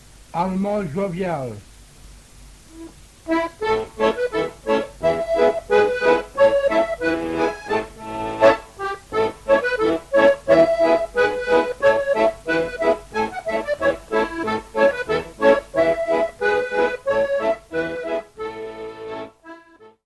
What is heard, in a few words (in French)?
Allemande Joviale - Belgique